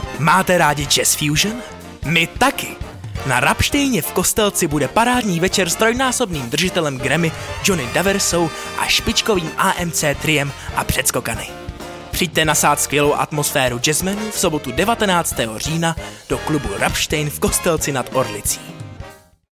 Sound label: promo jazz